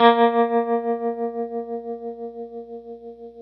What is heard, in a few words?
GRTCH TREM A#2; Sonic Reality; American Heartland